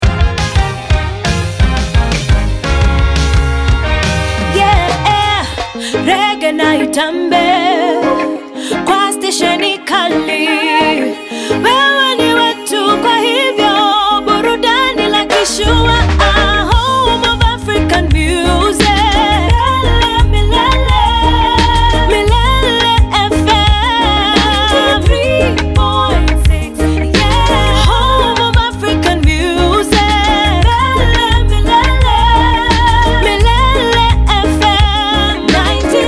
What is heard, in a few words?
Reggae